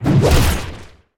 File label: Melee Swings Mono Designed Swings; 96khz; Weapon Swings; David Dumais Audio